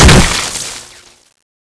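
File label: knife stab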